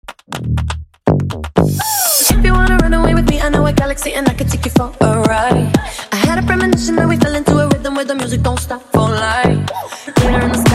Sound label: Funk Bootleg 122 Bpm